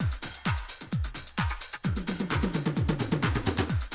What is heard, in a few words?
130, Classic DrumLoops